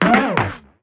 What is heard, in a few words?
perc